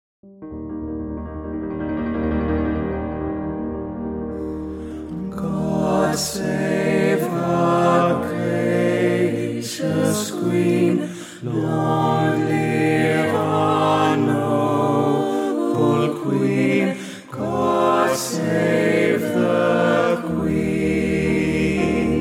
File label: national anthem full